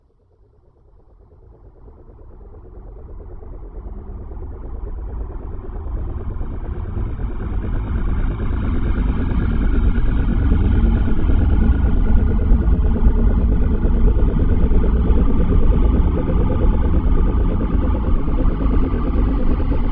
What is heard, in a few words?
glow atmospheric background